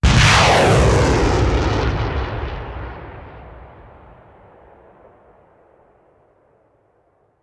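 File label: Artillery Missile Launcher; Sound Ideas; Guns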